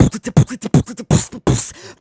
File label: Beatbox Loops, Human Beatbox